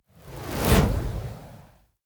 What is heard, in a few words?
fire whoosh big fireball slow bamboo; soundmorph